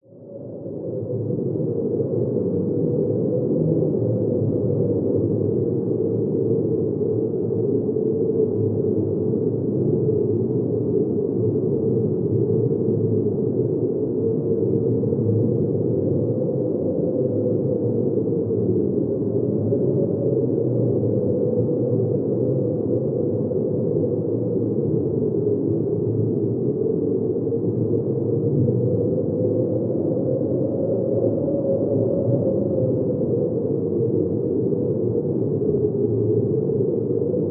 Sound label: nature wind constant strong howling storm